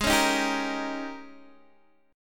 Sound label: Ab Major 7th Suspended 2nd Suspended 4th Ab Guitar DADGAD